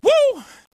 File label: "woo", male; ooh ahh; ho hoo woo